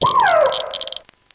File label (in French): Cri